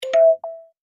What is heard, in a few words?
Subtle Notification Ding